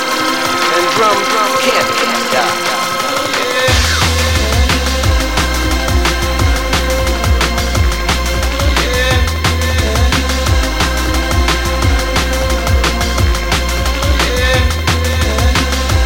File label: blues drum